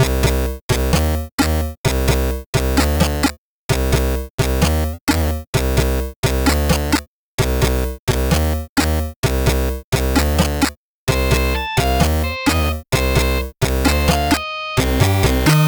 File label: hammer of the berserker chiptune; Fakebit / Chiptune Music Pack